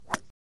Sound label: Golf Club Swing